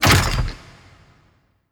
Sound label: cable drop; creatures; Half Life Alyx